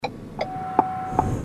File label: Click, click coming from your car